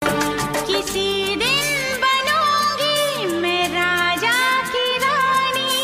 Old Song Ringtone

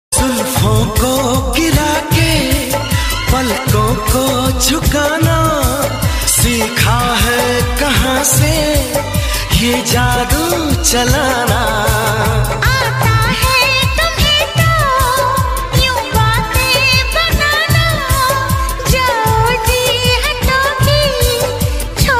Old Hindi Song Ringtone

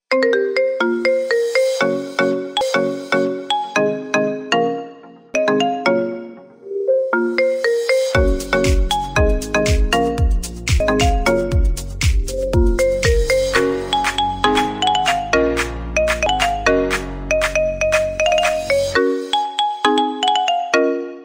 iPhone XR Ringtone; ringtones

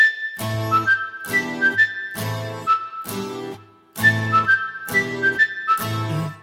New SMS Ringtone; ringtones

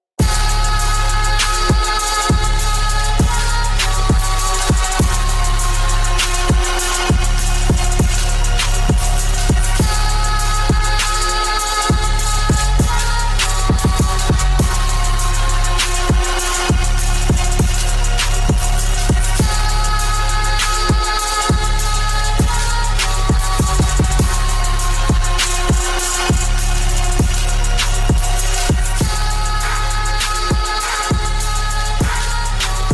New Sad Instrumental Ringtone; ringtones

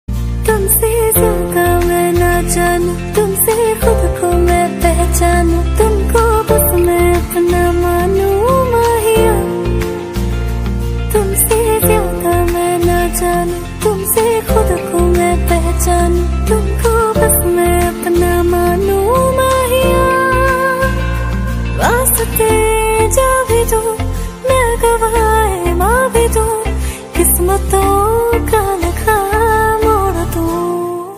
Song Female Ringtone

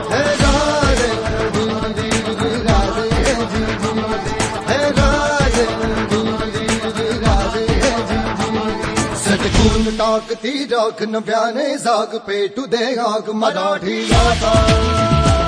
Marathi Ringtone; O Raje Mi Shivajiraje Bhosle Boltoy

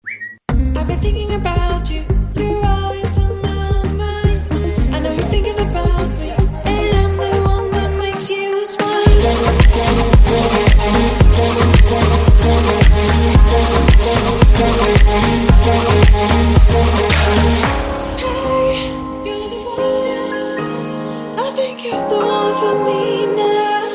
Vivo S1 Ringtone; ringtones

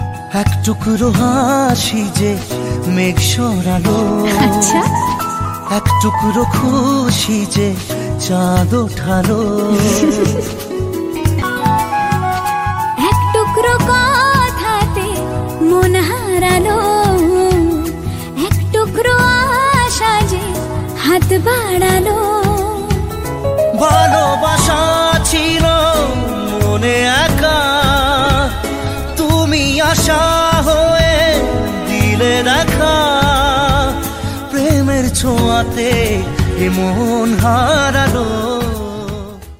Bengali Song Ringtone

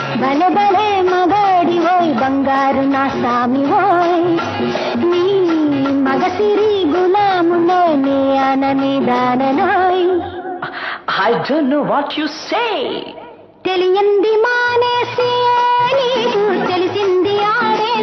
Old Telugu Song Ringtone

